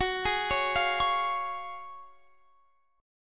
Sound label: F sharp Major 7th Suspended 2nd, F sharp, down, Guitar, Standard; arpeggiated; capo 9